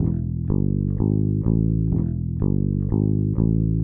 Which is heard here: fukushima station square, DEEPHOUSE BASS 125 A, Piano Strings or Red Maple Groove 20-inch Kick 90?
DEEPHOUSE BASS 125 A